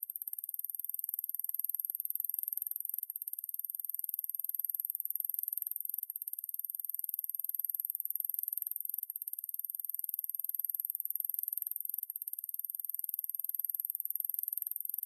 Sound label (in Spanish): insecto; berna; choir of species